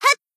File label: jump